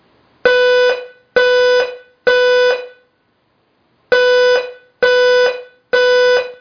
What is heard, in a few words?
Alarm 520 square